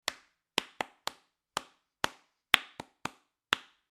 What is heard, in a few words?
Clapping 1 2 and 3 4